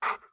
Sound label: Wolf Huff; Wolf Link